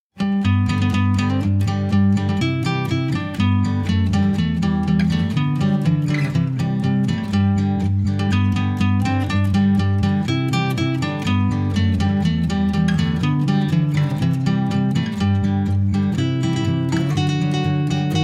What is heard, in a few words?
Instrumental; Pop instrumental